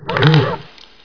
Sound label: zombie barn pain